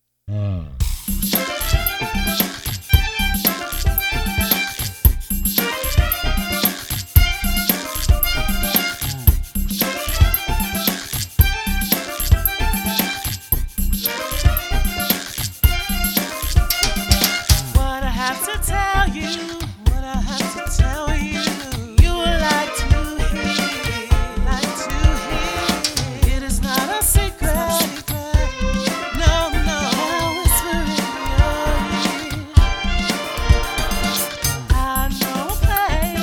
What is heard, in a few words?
Dance Sing; international orchestra